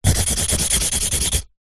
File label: office pen marker large scribble scrub out on cardboard